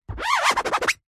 poster holographic scratch grooves with fingers whizz sound fast several; foley; zapsplat